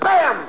bam

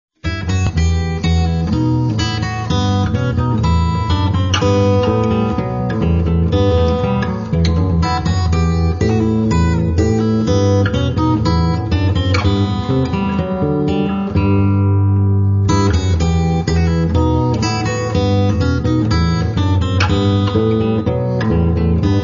Bourree

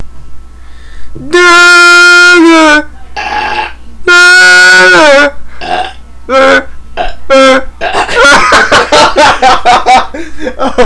haha

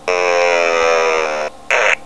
seagull

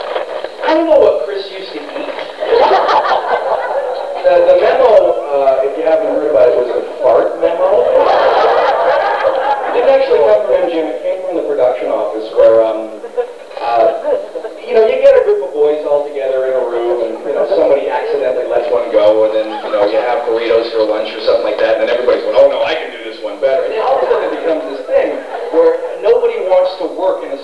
fart; tealc

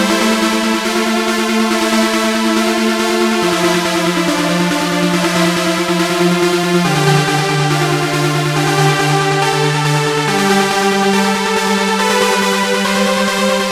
lead

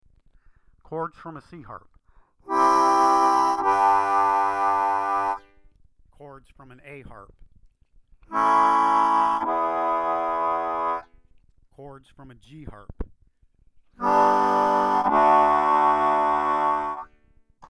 C A G Chords

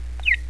chirp, traffic lights

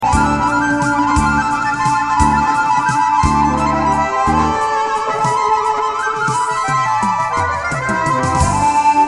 Stormy Seas speed

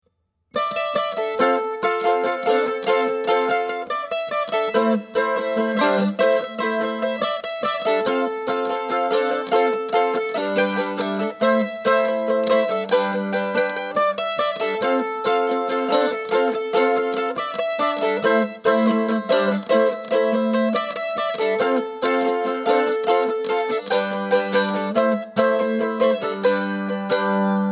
mandolin losing

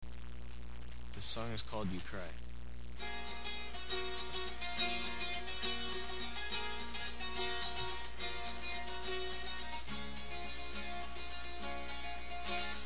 accoustic

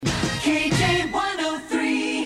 jingle

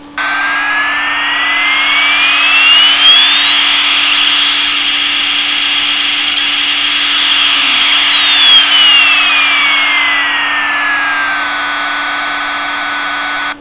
jet engine; jet engine sounds; 14 seconds long; Starscream/Ramjet

